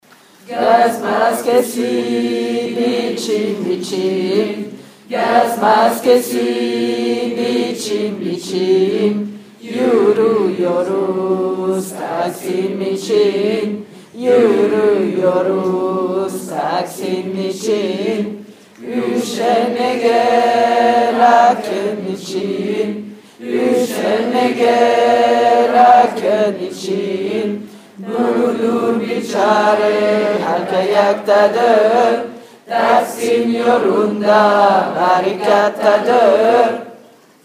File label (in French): basse couplet; voix basse 2ème couplet